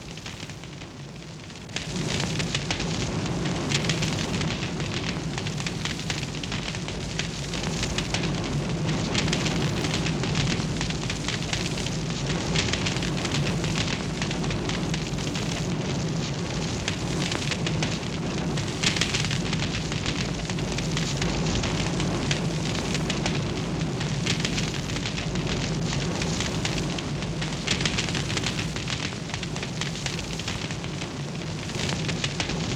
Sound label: Large Fire Burning